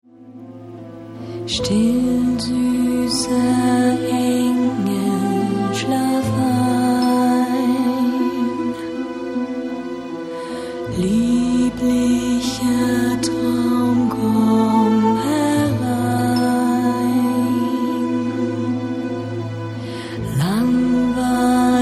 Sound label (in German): Schlaflied